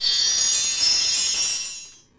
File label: shoe